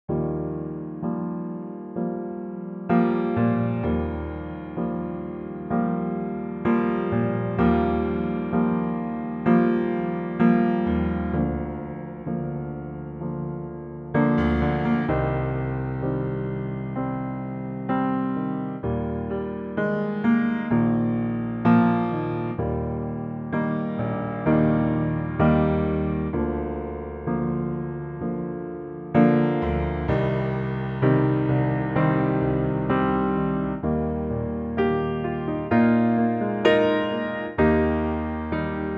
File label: Eb piano; key - Eb - vocal range - Bb to Ab (optional Eb falsetto)